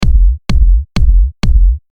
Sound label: kick